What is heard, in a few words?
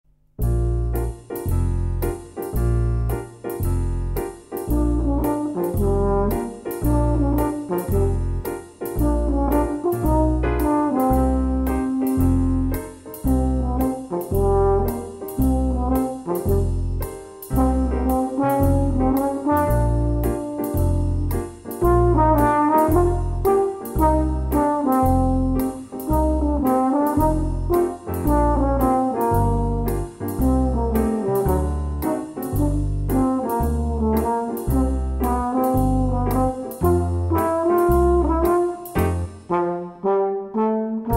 Smooth Groove